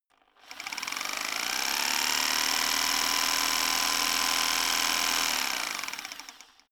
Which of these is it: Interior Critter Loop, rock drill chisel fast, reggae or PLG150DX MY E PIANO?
rock drill chisel fast